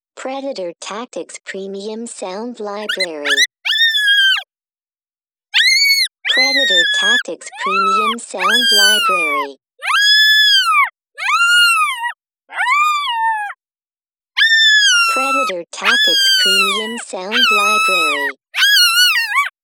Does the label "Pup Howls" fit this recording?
Yes